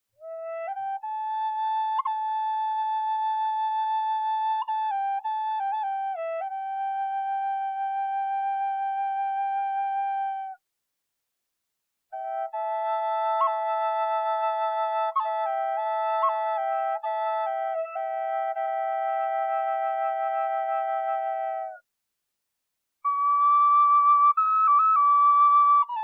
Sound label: Drone High E, Native American Drone Flutes; Scales; Full Spectrum Scale